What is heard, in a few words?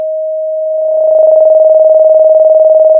pop, Sound of a phone ringing